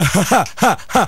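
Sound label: "huhha ha ha", vocal sample; Voice; ooh ahh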